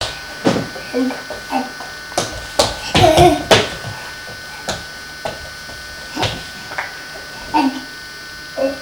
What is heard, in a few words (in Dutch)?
kruipen zonder blokje